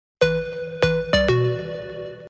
Modern Sms

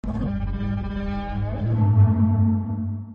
Godzilla Roarrr

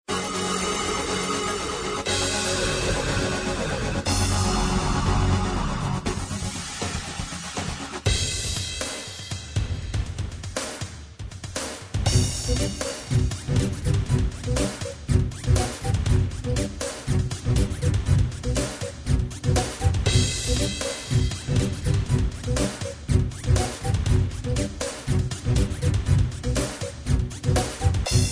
Cool Hip Hop

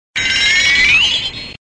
New type sound

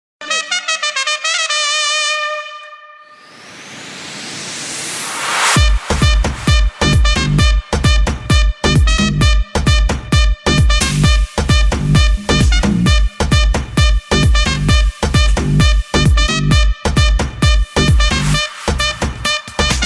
Saxphone Remix

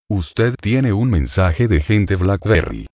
Tone

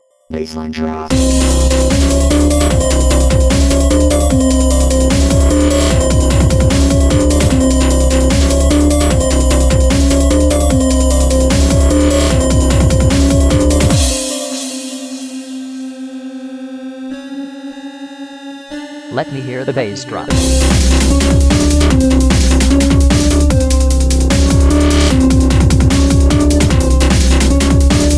drumstep Cool; Zed

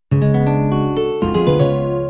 ping

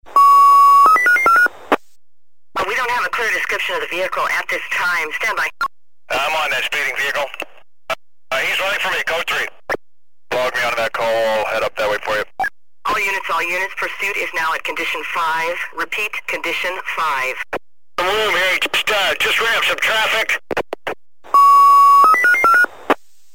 New Police Radio

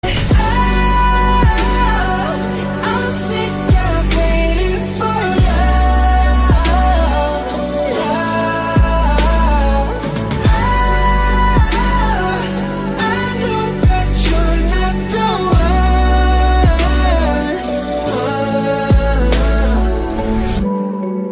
Chorus

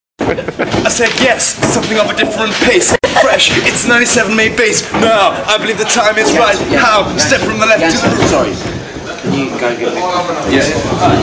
Rap; Ianto Jones

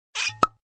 Cork Pop; Whiskey Vault

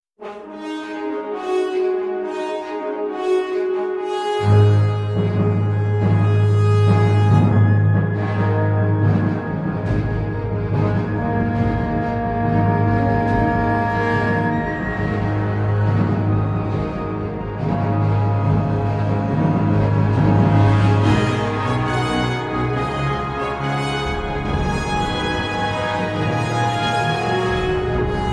Battle; Morrowind

